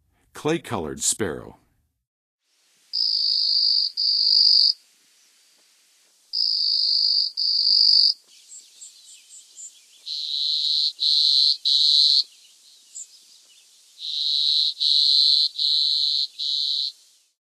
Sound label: Clay-colored Sparrow, Songs of Birds of North America; Western USA; Western USA Songbirds